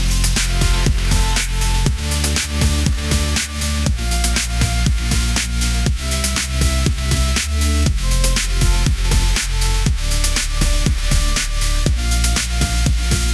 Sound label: pop groove